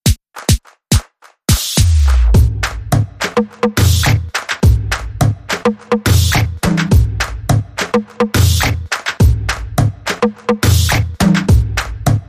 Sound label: Beat